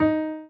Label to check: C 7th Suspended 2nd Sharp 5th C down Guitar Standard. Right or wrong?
Wrong